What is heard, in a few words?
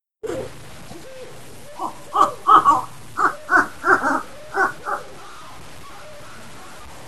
monkey bird